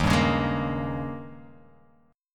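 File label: E flat 11th E flat, down, Guitar, Open F; strummed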